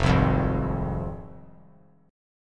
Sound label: F Minor F down; Fm strummed; JGuitar